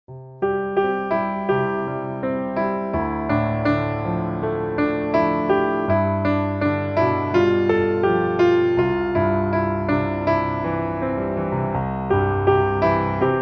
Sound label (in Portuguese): piano